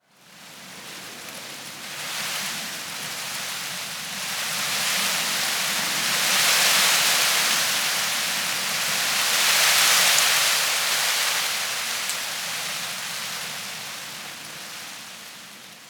tree leaves rustle medium

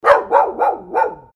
dog barking

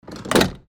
door close

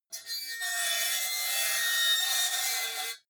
metal lid screech short

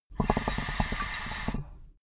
water pipe puff short

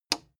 plastic button small simple push

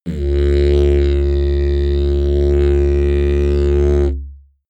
didgeridoo heavy soft

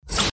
sword swish

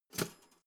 toaster pull down hard

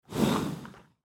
horse breathing

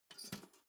toaster pull down

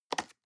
plastic box food small open hard

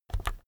keyboard external key press fast soft

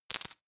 medieval collect coins medium

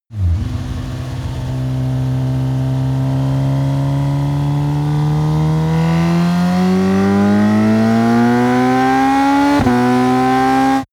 motorcycle sport bike start accelerate

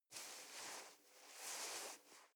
nylon leather very soft

